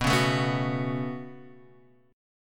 Db 7th Suspended 4th Sharp 5th B

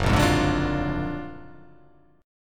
F sharp Augmented Major 9th F sharp

